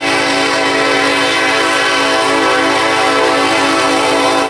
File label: Long, Train Horns; Nathan K5LLA; PFx Brick